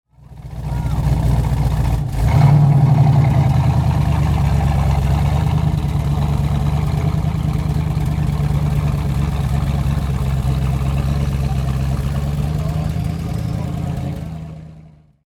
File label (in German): Soundeindruecke American; Amerikanische Klassiker